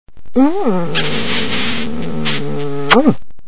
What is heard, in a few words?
big kiss